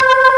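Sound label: ORG, Vintage Keys B3, ORGANS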